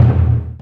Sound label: LARGE DRUM, JAPANESE PRC